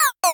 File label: bubble; SM64 CO-OP; Junio Sonic